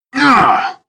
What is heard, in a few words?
zero med pain